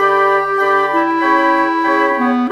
Winds, Orchestral, Rock Pop; Sonic Foundry (Sony Creative Software); Orchestral Series VOL-4; Rock and Pop; Rock-Pop 04

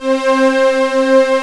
OB, OB modular; Greytsounds VOL-1

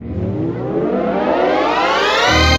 Rise Pad; Zero-G Jungle Warfare 1, 2 & 3; 90 s Sample CDs

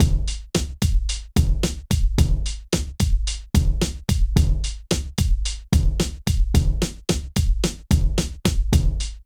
POP; Funky Xtreams; INIS

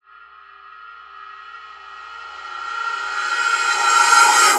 Reverse Fx; musicradar

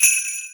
Yamaha CS6, Drums

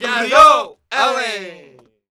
Flamenco, Vocals, Male

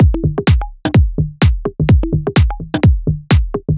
Wander C# 127bpm, House Drumloops; 90 s Sample CDs; Zero-G House Fabrik Drumloops; ZG Drumloops

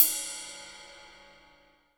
CYM, Dance Drums; Best Service ProSamples vol.15; AKAI

